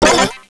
magic

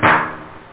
reverb; da capo vol1; drums snare

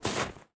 Walk Snow; Serious Sam II; Player

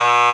cant move

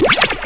telep

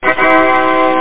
TADA

